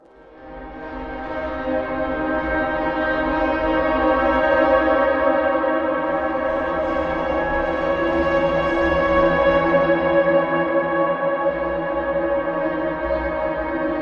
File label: Loop processed; Dawesome Novum